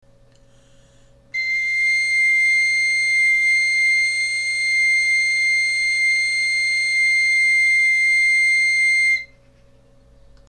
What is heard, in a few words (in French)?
ut do, galoubet; Note Do - 3ème interligne